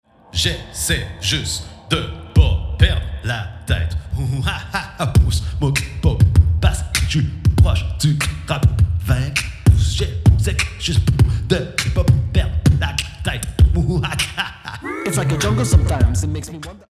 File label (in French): Chant beatbox